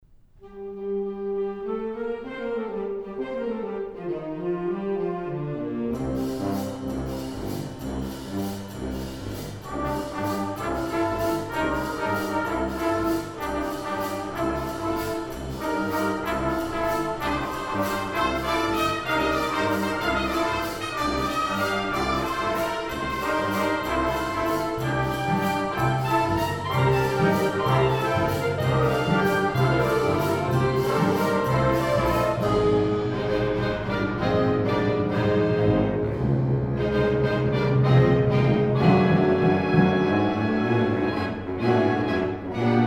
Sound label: bandancing waltz